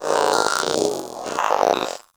Companion Vocal Breath Walk; Pets; Warframe; Zanuka

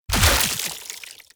Dismember Gore Impact; Warframe; Bullet Impacts

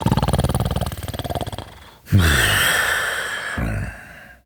Snores, Father, Vocalizations; Warframe; Deimos

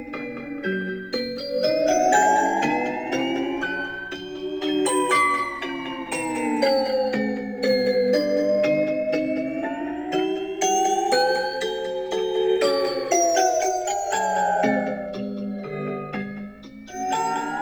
Follie Enemy Non Pos Music Loop; Warframe

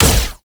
Proj Fire Shot; weapons; Lizzie; Warframe

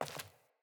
Sandals Walk Default, Footsteps

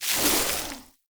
Hoverboard Terrain Caster Snow; Warframe; Vehicles; Venus

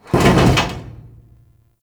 Large Vehicle Creak; Ambience; Props; Warframe; Nineteen Ninety Nine

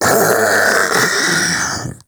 Vocal Death, Infested; Splitter Split; Grey Strain

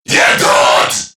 Surprised Crewmen; Warframe; CorpusCrewmen; CrewmanA

